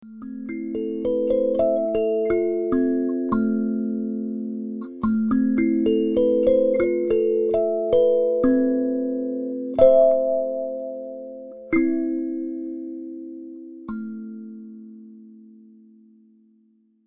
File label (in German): Sansula a-moll